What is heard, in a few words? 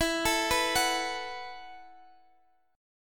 E Suspended 2nd Suspended 4th E, Guitar, Drop D; x,x,14,14,12,14; down